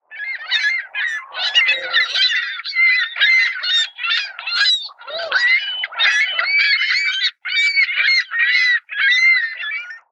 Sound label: seagullys